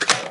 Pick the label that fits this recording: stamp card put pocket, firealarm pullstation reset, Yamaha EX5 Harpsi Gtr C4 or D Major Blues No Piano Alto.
firealarm pullstation reset